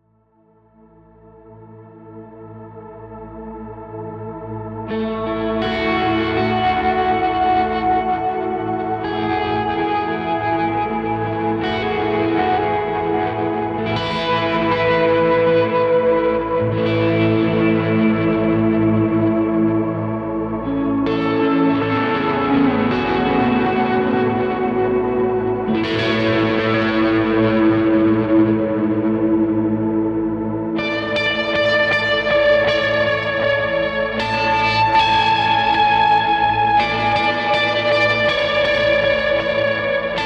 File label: Motion Pads